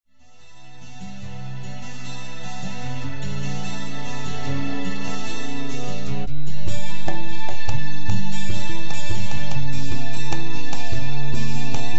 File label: Melodic